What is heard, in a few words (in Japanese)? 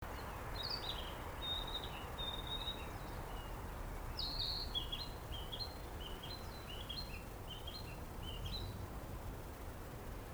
kibitaki